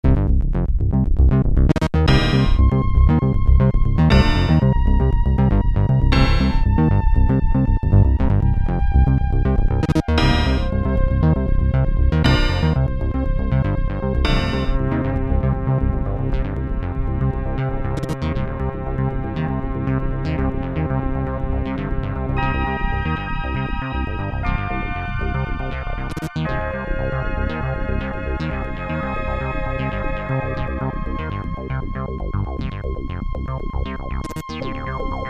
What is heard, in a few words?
DW8000